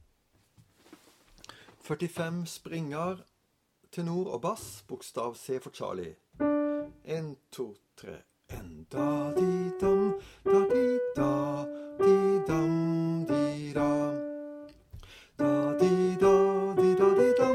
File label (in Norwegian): Springaer Tenor; lydfiler for korister